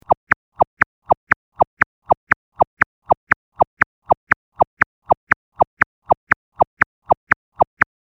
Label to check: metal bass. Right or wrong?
Wrong